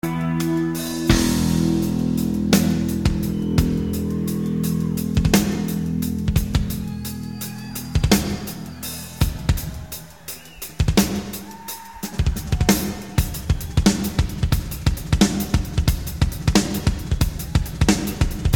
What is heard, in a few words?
Drum Solos